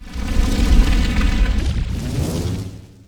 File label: World Map Vines Moving